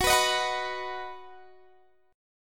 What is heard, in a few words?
B-Major F sharp